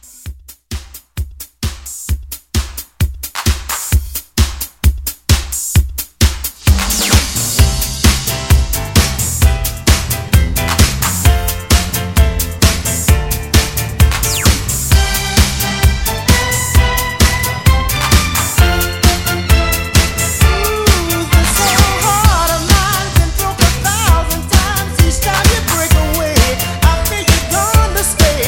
60s Soul Re Drum 131

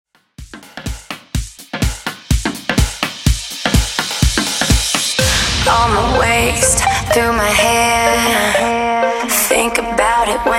Dance House 125Bpm

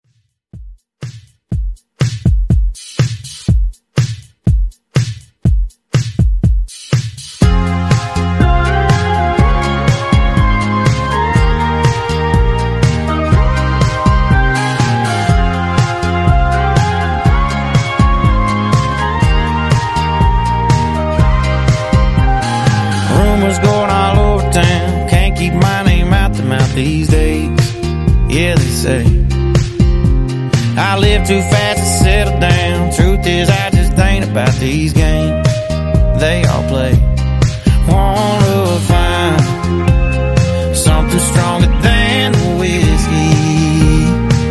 122BPM

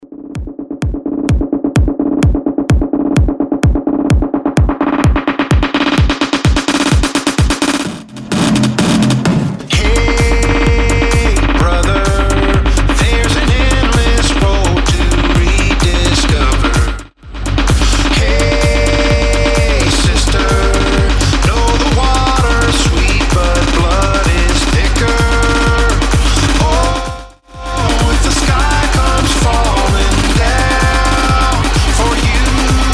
Smashup 128bpm

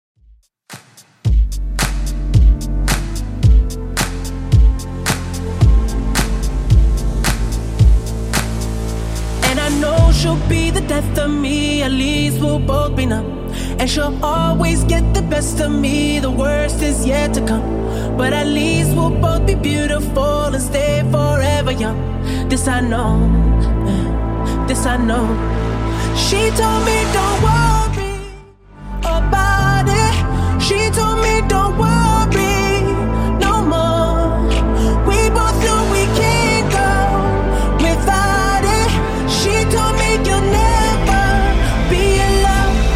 Redrum 110bpm

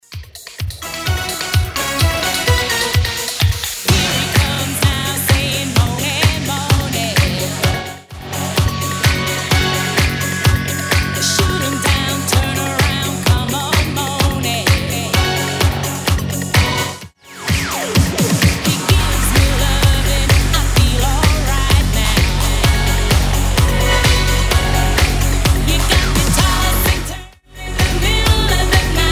Bootleg Smashup 128bpm

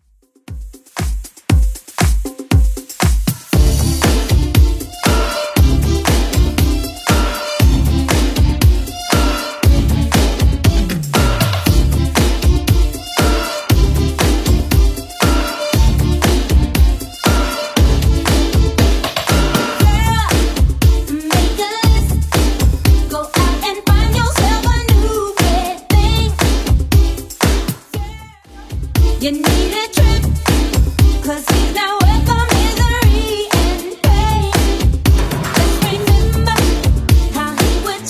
80s Club Edit 118bpm

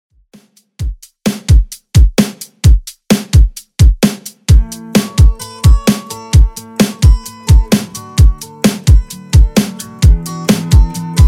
60s Real Drum 130bpm

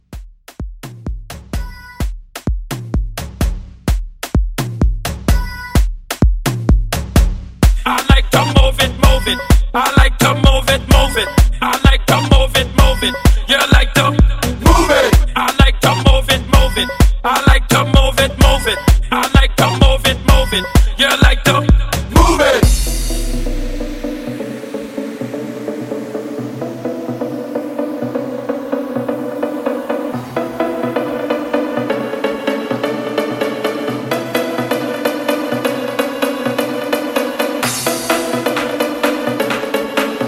Afro 128; Mixshow Edit

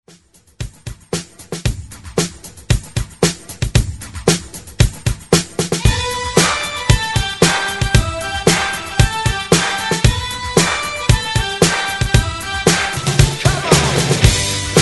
80s ReDrum 114 Bpm; remixplanet

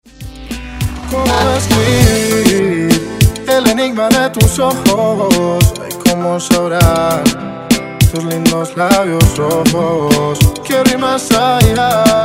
Reggaeton Redrum

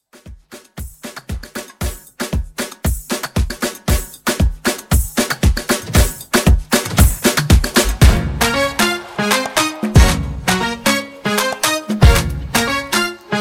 Latin Moomba Re Drum 116

